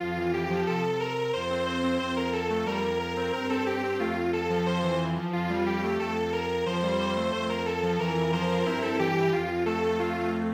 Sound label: polka Strings Quartet; traditional